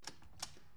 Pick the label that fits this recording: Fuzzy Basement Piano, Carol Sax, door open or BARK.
door open